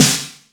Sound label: acoustic snare d key; snare drum samples